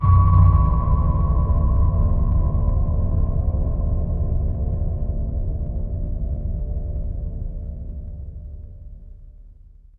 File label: horror stinger